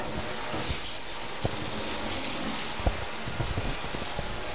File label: Rain Storm; Weather